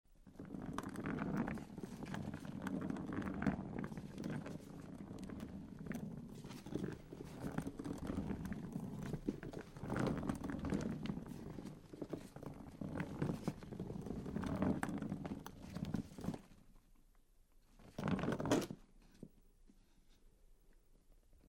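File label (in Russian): чемодан по полу в аэропорту; Звуки аэропорта; Звуки атмосферы терминала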